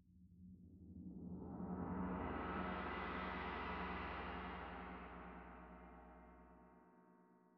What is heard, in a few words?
Tam-Tam; Tam-Tam Rear